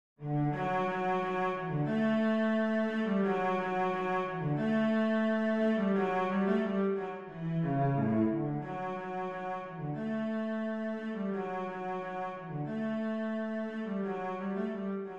spring cello melody; fourseasons

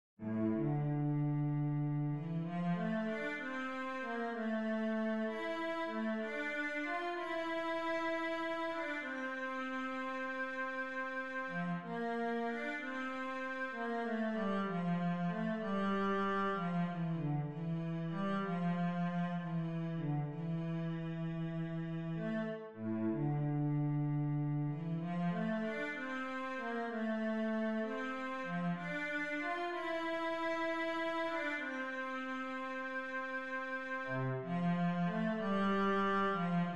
elegie cello

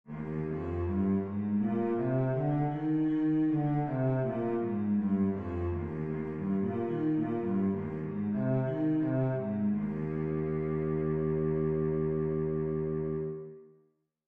cello E flat major scale

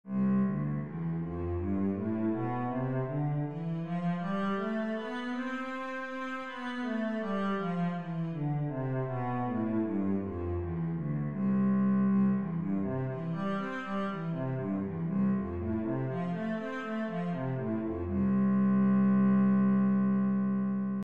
c major, cello

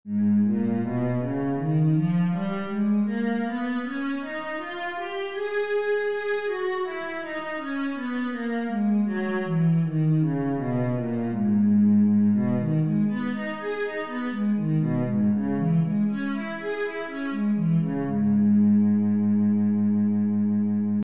cello, g sharp minor

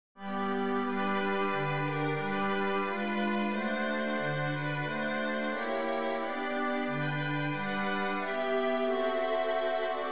bells

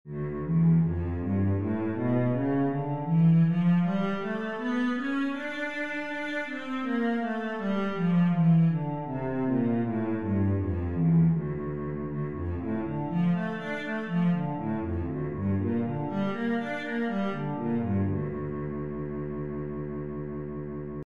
d minor, cello

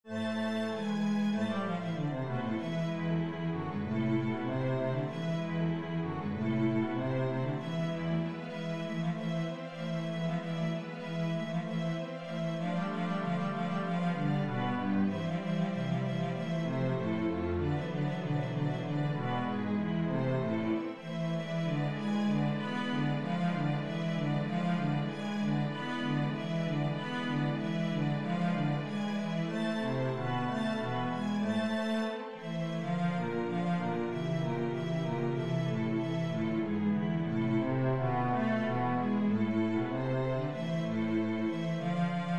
concerto a minor, cello

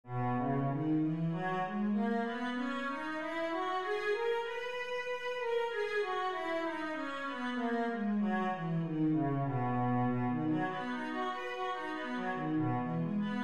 b major, cello

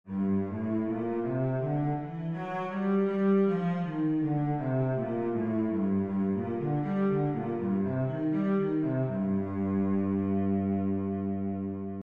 cello G minor scale

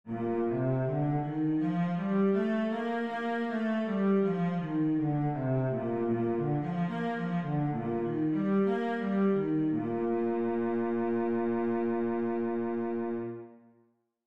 b flat major, scales, cello

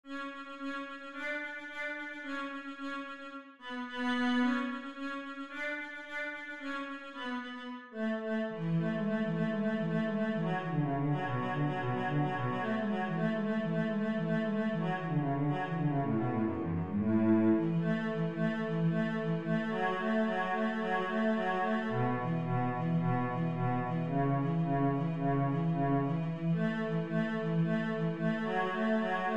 fiddle easy cello

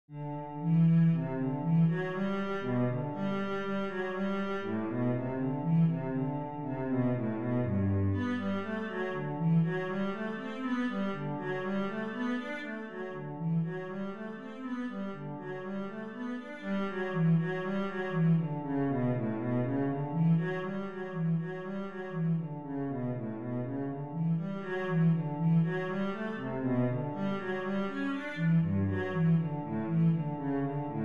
etude, cello

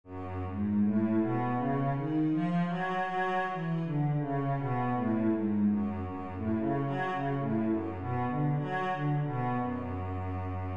cello F sharp minor scale

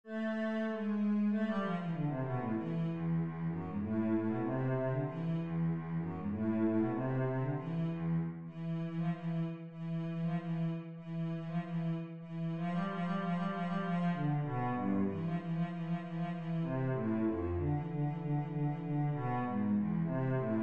concerto a minor cello

